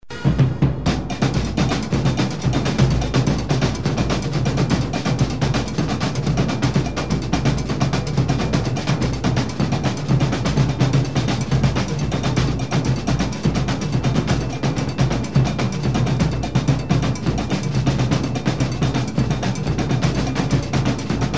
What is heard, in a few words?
drum calypso